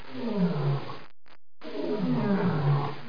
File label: lion, animal; Image Bonanza; Expert Software